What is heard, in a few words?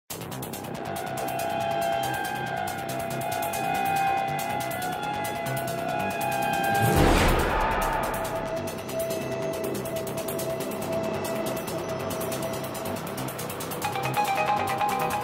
mysterious music; موسیقی معمایی بی کلام